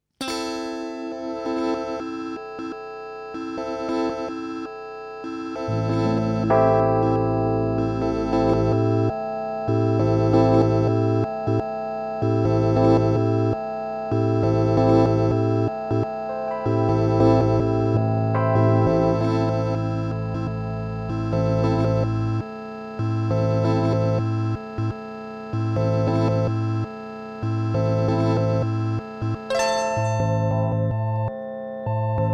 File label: Glitch A guit keys Dimension